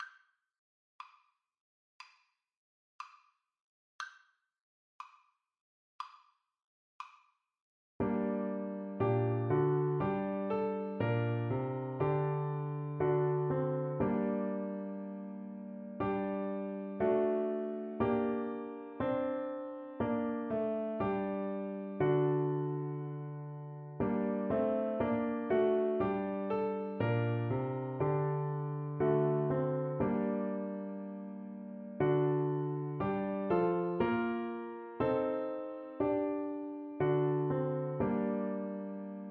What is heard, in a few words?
aberystwyth, Clarinet